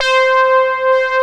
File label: MOOG LD; MINIMOOG; Greytsounds VOL-1